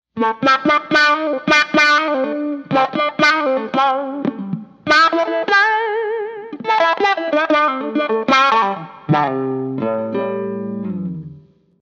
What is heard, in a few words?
Trig Wah